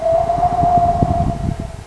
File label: wind tunnel; Sound Effects for Multimedia - Volume 1